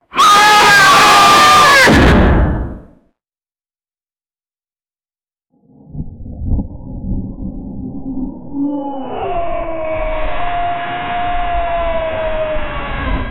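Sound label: blowtorch fire demon scream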